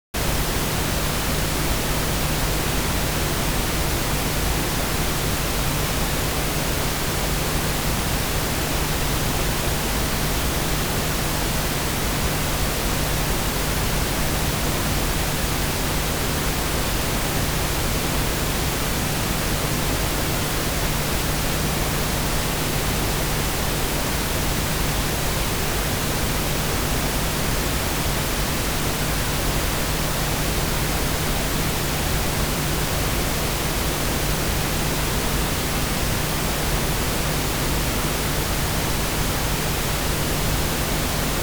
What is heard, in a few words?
pink noise 10 dB stereo phase